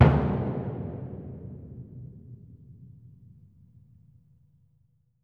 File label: Concert Bass Drum; Percussion; Cymatics - Infinity Beta Pack 2.0; Zeus Percussion Collection